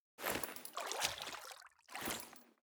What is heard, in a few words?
Foley Step; Garren Heal Part Three; Garren Boss Arena